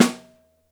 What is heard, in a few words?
Snare Mono